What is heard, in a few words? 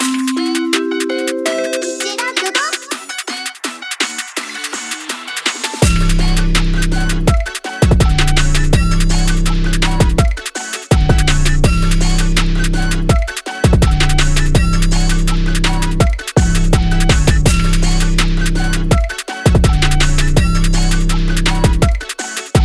Gm BPM165